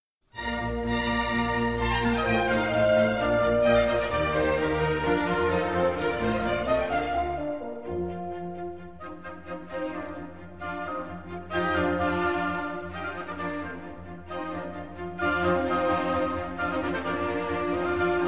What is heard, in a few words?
Violin Concerto No. 1 in B-Flat Major, Allegro Moderato; Mozart; K. 207